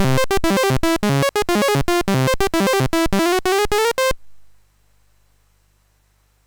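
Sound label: Pac Man, MOS 6581 SID chip music, games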